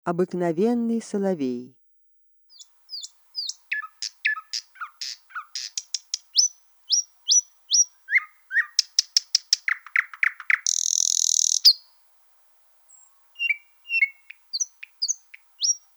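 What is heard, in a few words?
common nightingale voice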